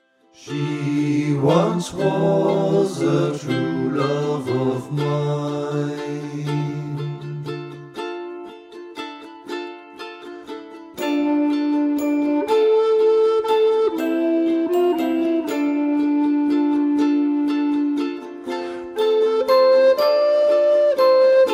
scarborough fair ukulele gesang

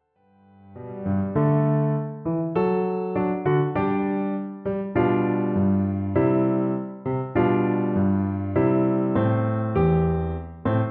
konzert fuer viola in g dur 2 satz; g ph telemann

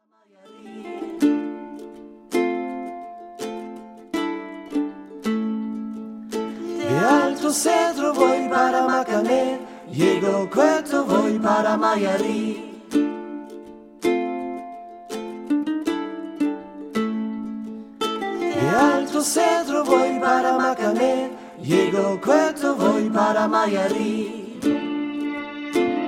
ukulele gesang

